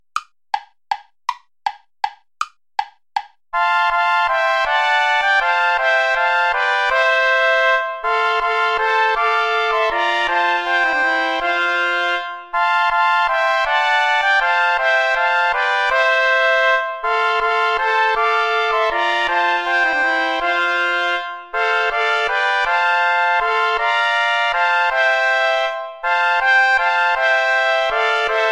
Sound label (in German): lobt gott den herrn ihr heiden all play along without trumpet; vulpius melchior